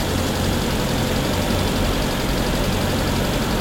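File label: running of started working engine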